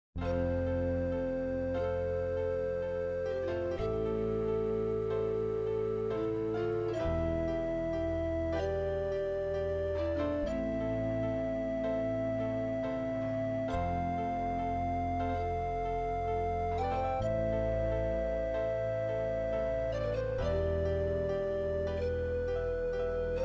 pan flute with melody short

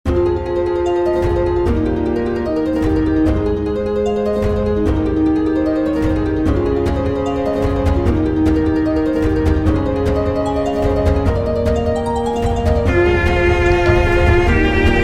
epic loop

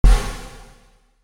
drum

